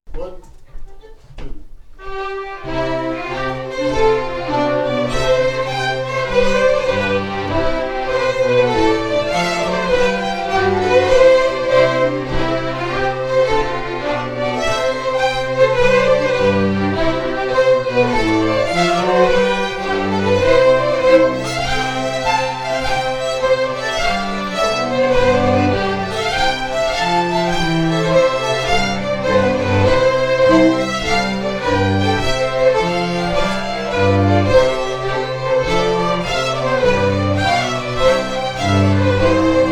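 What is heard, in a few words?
Strathspey, Scottish Fiddling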